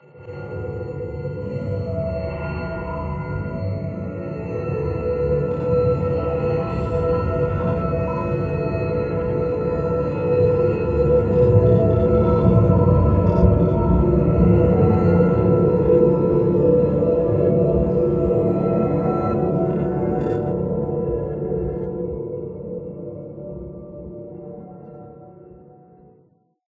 Suspense

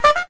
Wowozela

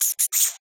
Spider Small

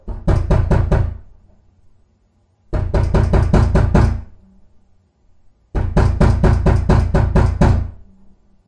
Pounding

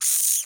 Spider Small

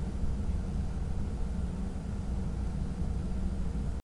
Corridor

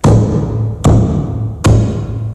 Wall Slamming

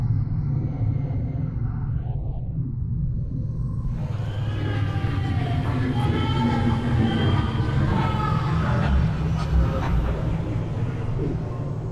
Hell Portal Idle

